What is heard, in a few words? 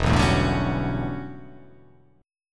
E Minor 13th chord